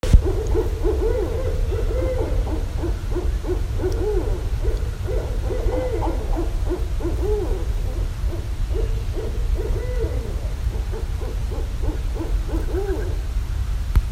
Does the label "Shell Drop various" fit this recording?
No